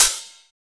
Open HH, Cymbals

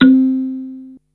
kalimba, Yamaha Motif ES drum machine; 300 Drum Machines; Yamaha Motif ES-Series

